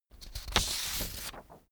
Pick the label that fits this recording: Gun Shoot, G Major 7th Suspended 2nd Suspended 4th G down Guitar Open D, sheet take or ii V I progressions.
sheet take